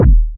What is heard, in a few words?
fat kick sample d sharp key; kick drum; one shot